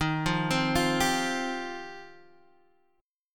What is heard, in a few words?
G 7th Suspended 4th Sharp 5th Eb